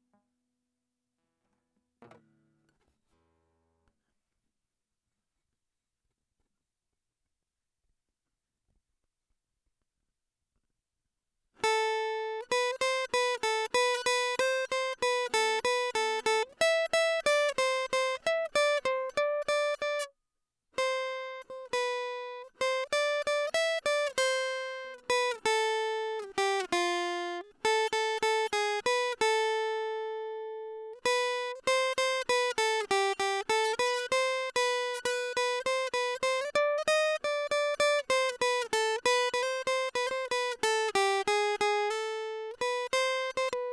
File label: guitar nice